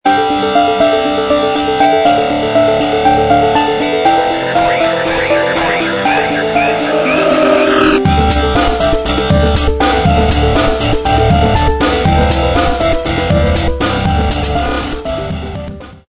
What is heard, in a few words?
beat